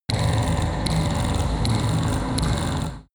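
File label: extrinsic chirp low, energy; energie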